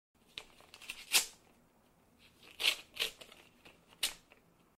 envelope; باز کردن

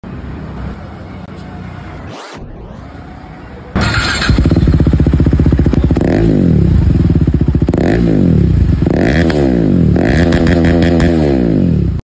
RC exhaust

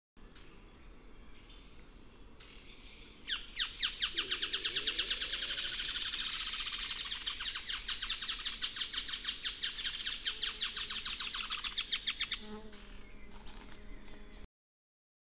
Striated softtail

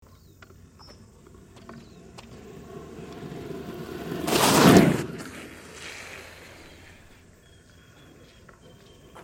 scooter; حرکت اسکوتر

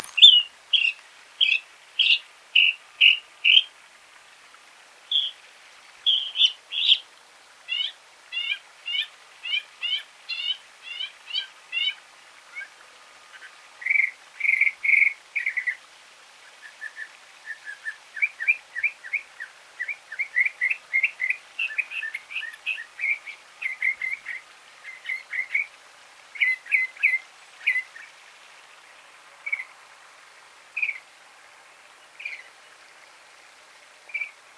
Northern mockingbird

